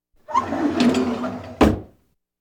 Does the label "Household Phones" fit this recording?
Yes